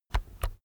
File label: keyboard external key press slow soft